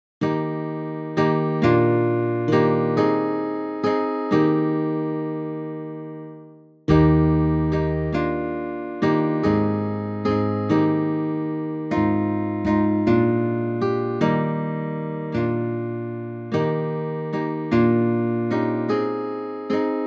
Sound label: guitar; Hymnal 1982